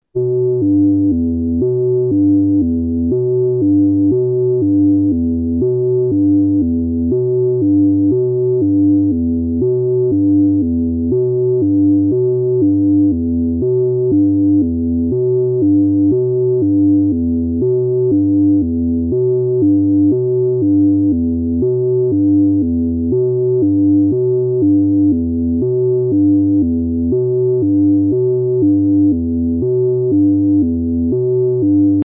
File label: xbass